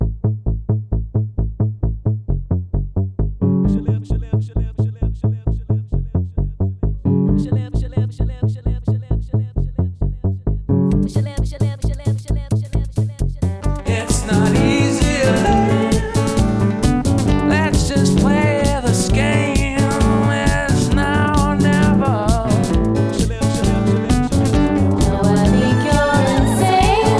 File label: Title Song; Singles Flirt Up Your Life